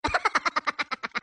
risa; minions

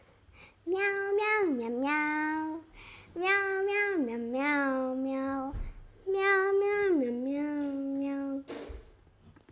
miau

